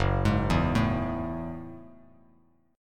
Gb Minor 9th, Bass Standard; arpeggiated; JGuitar

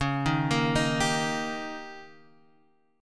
D sharp 7th C sharp, guitar

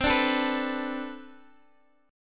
B-Minor 7th C

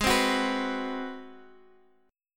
Ab 7th Sharp 9th down Guitar Standard; strummed; JGuitar

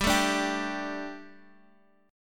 A 7th Suspended 4th G

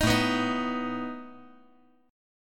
A Suspended 2nd Flat 5th A down

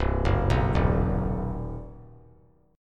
C Minor Major 7th Flat 5th, chord; arpeggiated; 3C,3F,3A,3D; 0,1,2,1; JGuitar

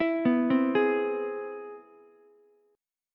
C Augmented Major 7th C down Ukulele; arpeggiated; JGuitar

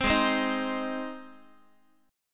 Ab Major Ab down Guitar; Ab strummed

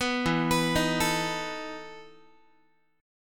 E Major 7th Suspended 4th, Guitar; EM7sus4 arpeggiated

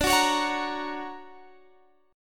D Augmented Major 7th Guitar Drop D, chord

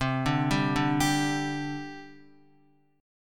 C Suspended 2nd, C, down, Guitar; arpeggiated

